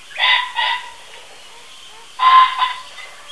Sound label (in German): fasan